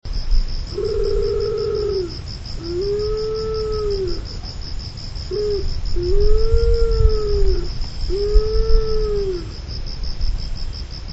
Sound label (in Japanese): karasubato call; yanbaru